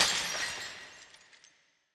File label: shattering window